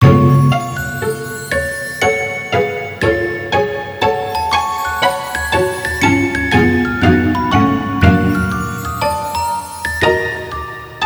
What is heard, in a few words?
music